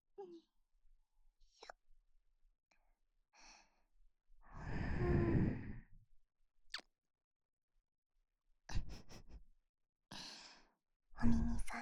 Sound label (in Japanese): 左みみさんの耳かき